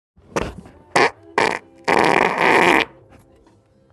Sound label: Brapbrap braaaaraaaa